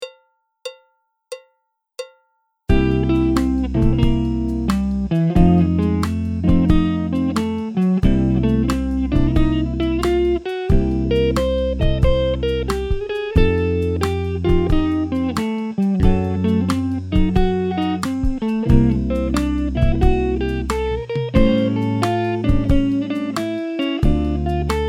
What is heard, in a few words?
Jazz Blues Solo